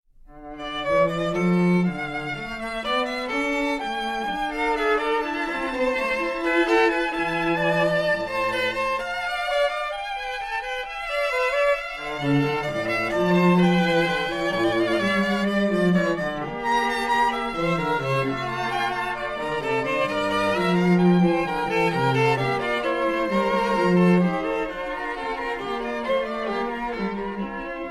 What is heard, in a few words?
Sinfonia in D Minor, Violin; Sinfonia No. 4 in D Minor, BWV 790; Benjamin Britten